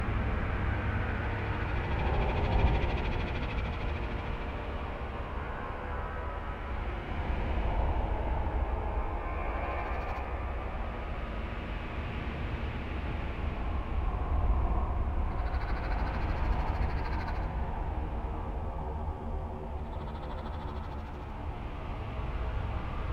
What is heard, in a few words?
drone